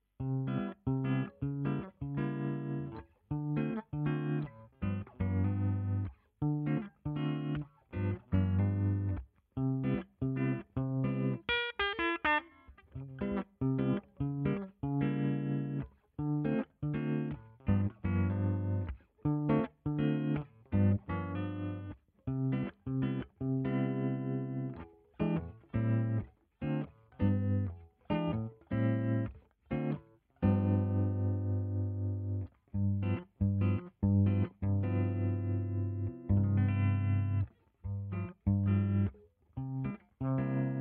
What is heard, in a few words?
fender tremolux tweed